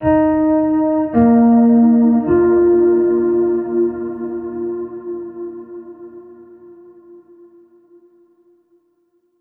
Overlay Tone

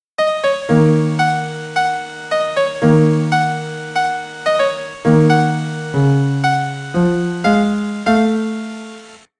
Piano Lesson; Tales of Phantasia 20th Anniversary Sound Box; SFC

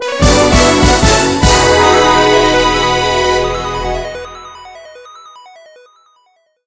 level end; Mighty Switch Force 2; 3DS

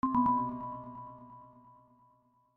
Lose Sound; Reason Casual Puzzle

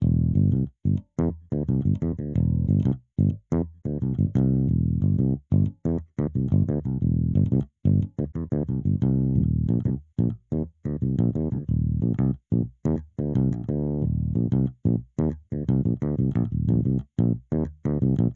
Elec bass

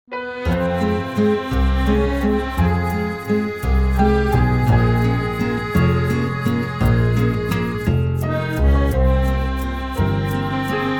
France Theme Accordion

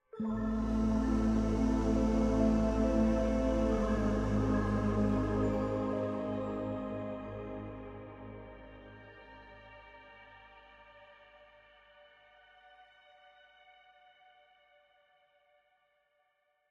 Cave Music 1st Ending

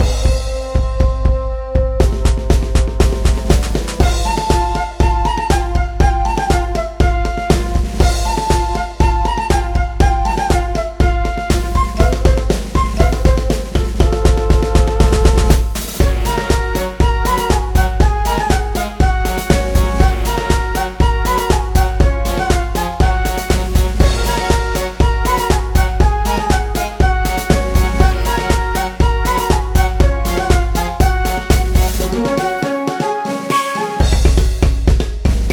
Rhythmic; Guardian Tales

